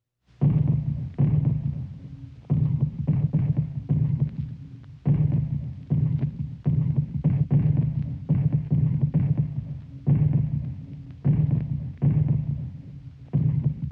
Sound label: Anti-Aircraft Fire